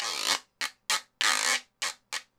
guiro 100, latin, 100bpm; Sound Effect Library

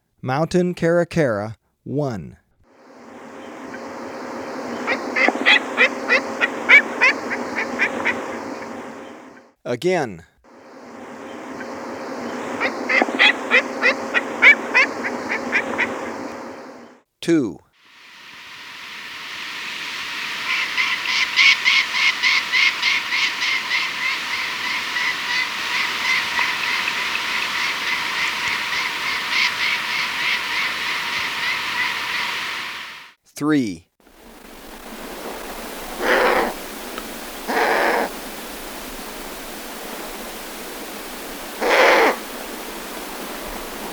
Mountain Caracara, Birds of Ecuador; S. America

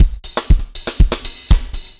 dance floor tight 120, loops

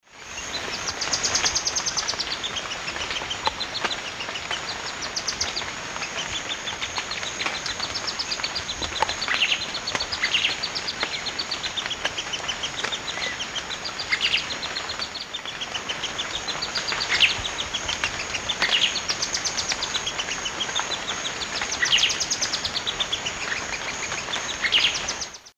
Epinecrophylla erythrura, song; S. America; Eastern Lowlands

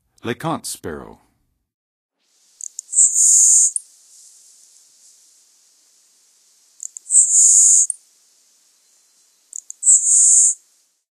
LeContes Sparrow, Songs of Birds of North America; Western USA; Western USA Songbirds

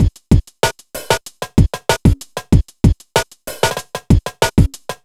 kit; Sound Effect Library

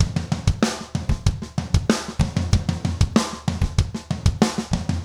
rock toms 90

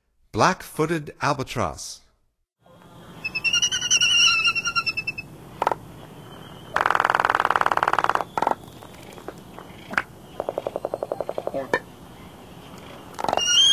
Black footed Albatross; North America; Stokes

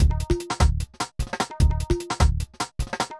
bangra 150

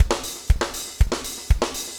4 on floor 120, loops, 120bpm

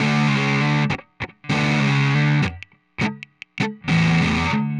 Heavy Riff, Guitars; Rock

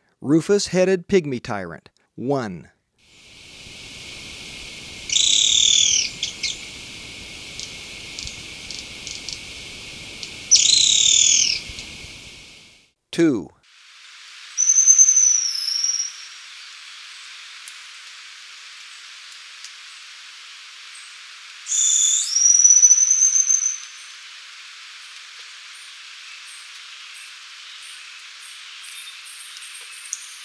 Rufous-Headed Pygmy-Tyrant; S. America

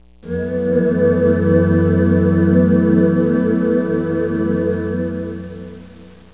VOX

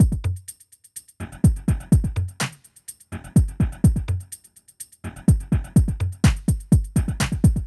afrobeat 125

